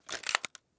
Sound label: eoka insert bullet; Rust